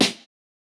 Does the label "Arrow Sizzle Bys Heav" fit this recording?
No